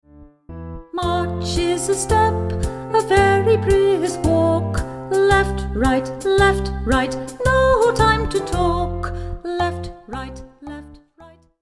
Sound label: march